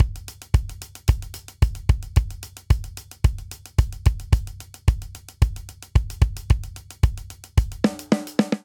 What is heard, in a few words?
Classic Disco Buildup 111 bpm; 4 4; MIDI Clips; Ableton; Factory Packs; Drum Booth